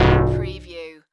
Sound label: Multimedia Error